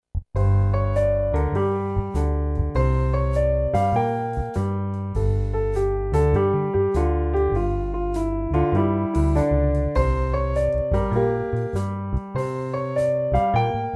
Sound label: Afternoon blues